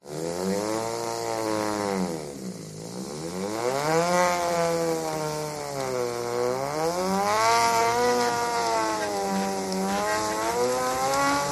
bicycle pushbike wheel tyre spin with paper or other object rubbing; vehicles; speed; zapsplat